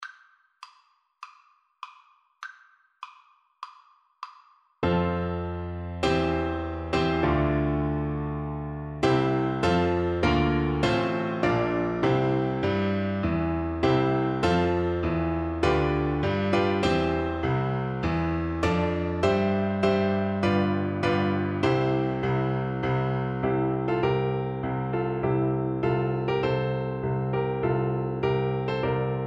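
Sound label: recorder karaoke; O Canada! (Canadian National Anthem)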